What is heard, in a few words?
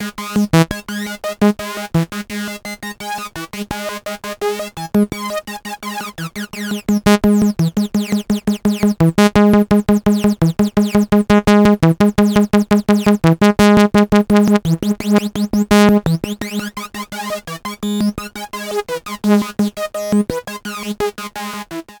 Sync Time Sync Trigger; Demon Core